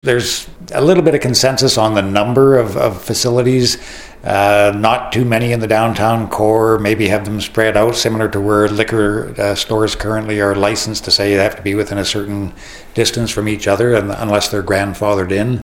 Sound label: Tom